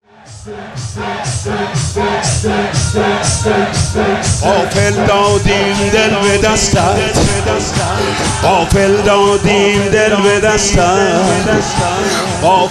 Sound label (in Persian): مداحی